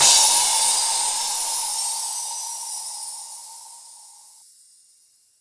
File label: soft hit finish; osu!